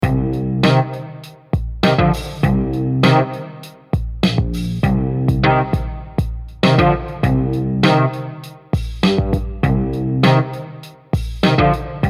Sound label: loop drums dist filter drum variations; songsnacks